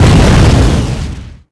Small Exp, Explosions

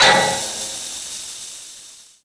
pipe hit; projectiles; bullethit; Max Payne

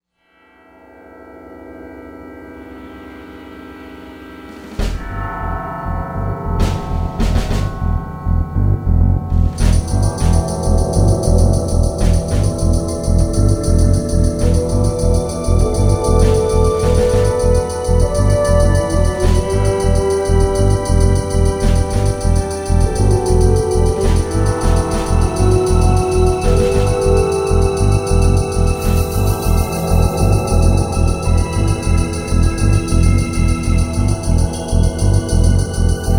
slow, music; Soldier Of Fortune II Double Helix; XBOX; shop

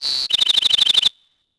houses bird; Tony Hawk's Project 8; XBOX

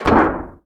Foot Duct, Footsteps

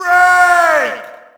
Shout, audience; UFC Tapout 2

